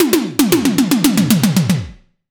totally normal drum fill no

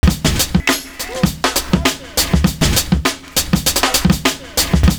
FUNKY MAMA, LOOP; Univers Sons

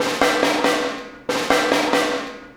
Impact Crater Beat, Processed Drumkits

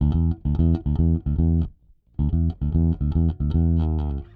Rock, Bass; Bass X

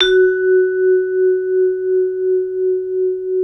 MLT VIBE

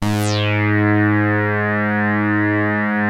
SAW RES WET, SYNTH

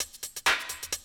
130, Classic DrumLoops

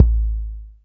BD, Bassdrums, Roland TD-20

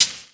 Shaker, Drum Machines; 300 Drum Machines; EMU MoPhatt

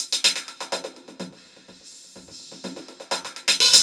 DRUMLOOPS, Disco; Best Service ProSamples vol.48 - Disco Fever; AKAI

